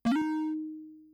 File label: zap three tone up; Kenney's Sound Pack; Digital Audio